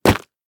Cave Land; The Inn

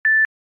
Beep Once; Samsung Galaxy S3 Ringtones; Verizon

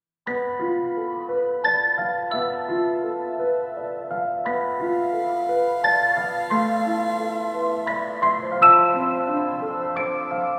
ui loop; oversea annual; Identity V

